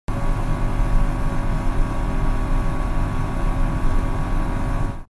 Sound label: computer fan; فن کامپیوتر